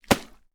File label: Water; TOOLKIT vol.2